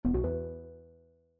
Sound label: button